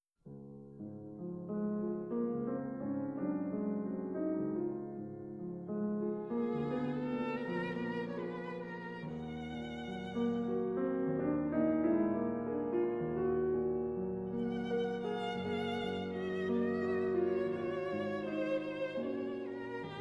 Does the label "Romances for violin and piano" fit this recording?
Yes